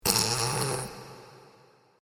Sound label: Stifled Laughter With Reverb